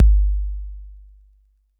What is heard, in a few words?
808 BD, TR-808; Crypton - DrumBase5000